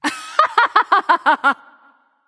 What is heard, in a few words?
laugh; smite; thanatos